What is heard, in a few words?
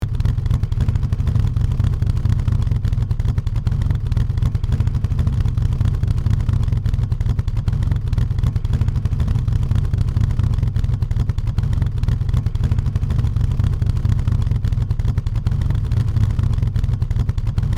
City scooter idle engine loopable